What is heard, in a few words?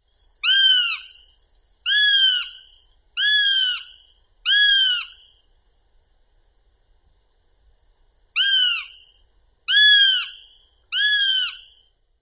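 juvenile calls, northern goshawk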